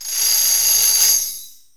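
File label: percussion tamb shake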